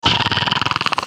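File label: attack, basilisk; basalisk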